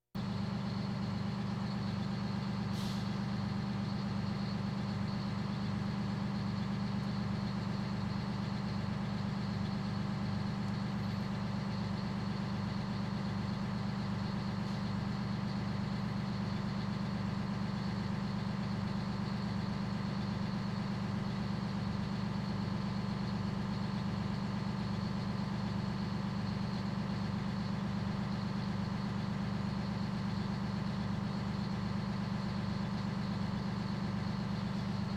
Police, Fire engines

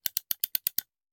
Hand Gun Foley; Sound Ideas; Guns

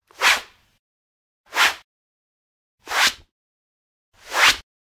Generic Slide; boom library; The Interface

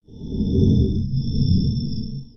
Shuttle Fly By; Sci Fi; 1001 Sound Effects

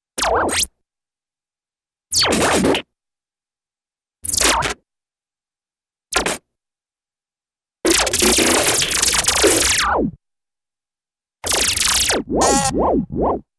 Synthy Beep-like Zaps; Cartoon; Hollywood Edge; Cartoon Trax

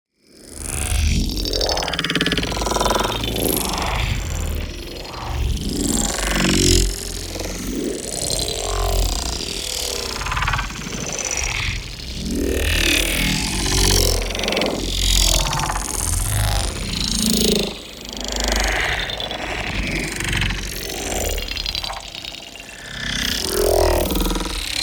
MOVEMENT; Transformer; boom library; Neon

